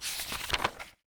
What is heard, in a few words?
book